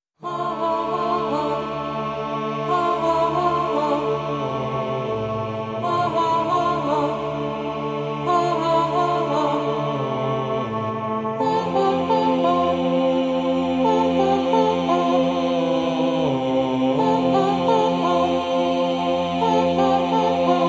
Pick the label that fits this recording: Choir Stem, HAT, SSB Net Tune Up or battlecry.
Choir Stem